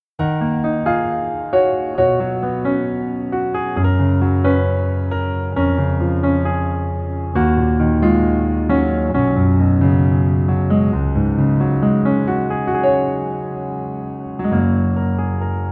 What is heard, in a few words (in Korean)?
Piano ver 멜로디 MR